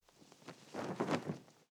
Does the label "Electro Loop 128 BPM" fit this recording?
No